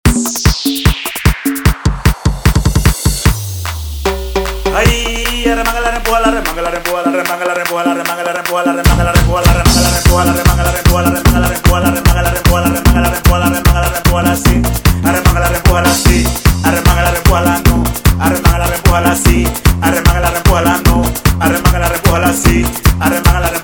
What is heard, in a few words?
arremangala 150bpm